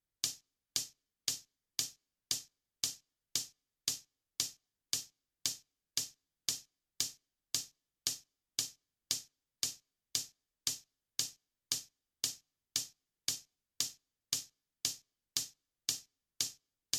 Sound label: warm up